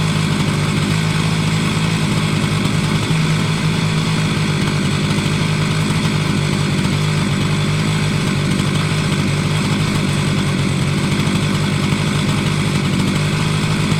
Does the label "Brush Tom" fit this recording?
No